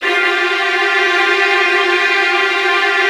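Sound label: Virtuoso Strings, violin trills, F1; ILIO; Kirk Hunter Virtuoso Strings VOL-1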